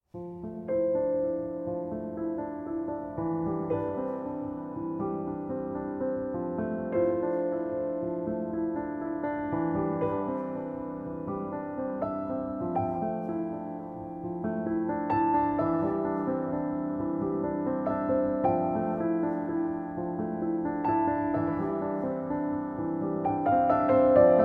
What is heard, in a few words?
Solo Piano